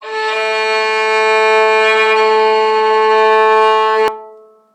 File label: Violin A; Instruments; 7rystan's 700+ FREE COLOSSAL EDM SAMPLE PACK